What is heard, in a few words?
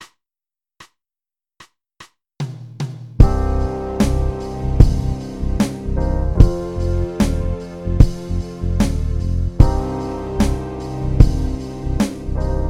keys